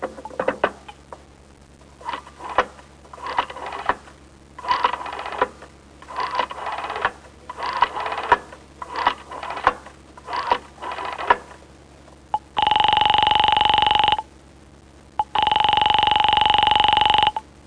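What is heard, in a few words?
dial ring, household clock phone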